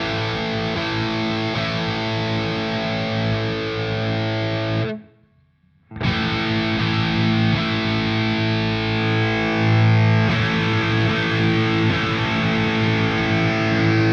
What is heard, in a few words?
Vox Valvenergy Copperhead Drive FX Loop